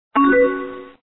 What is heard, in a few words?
submit message, chat; FlashChat v3.931